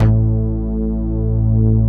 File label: PUNCH BASS